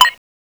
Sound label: beep, LazerTag